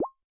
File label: Obstacle Instrument Harp; hello kitty friends match; android